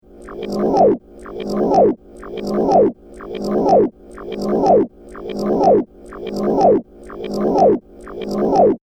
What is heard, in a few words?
Reverse Water Bubbles Loop; Water Sound Effects